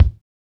90s hiphop kick; Reference beat bpm90

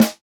snare, snare house

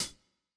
hihat; Reference Beat BPM-130; Pophits

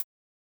Korg hihat

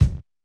kick, lofi hiphop; Legendary lofi hiphop

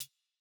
hihat; Reference Beat BPM120; j city pop 1980

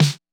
Dance snare; Pop

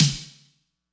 snare; pasific; Pop

